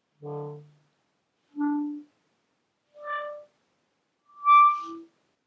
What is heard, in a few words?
short tongue flutters